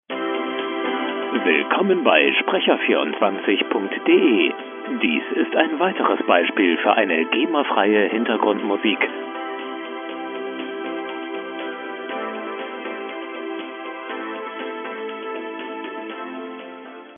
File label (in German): musik